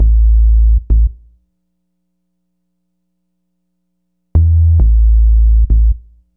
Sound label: Synth Bass; Basses